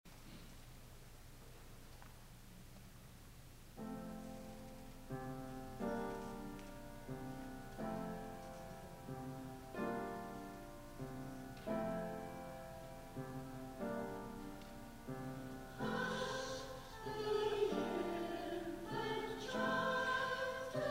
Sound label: Lullaby; Christmas 2015